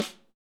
snare dry; Real Mega Drums

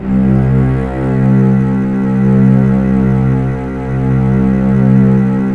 SAD CELLO, JV-1080 ORCHESTR; Syntec; Wall of Sounds VOL-2; ORCHESTR-EXP

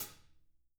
HNYLCLOSEH, XHATS; Sampleheads; Peter Erskine Living Drums; JZ XHATS

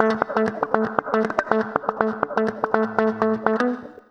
Acoustic Guitar Loops, 120

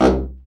BRA BARI, Bariton Sax

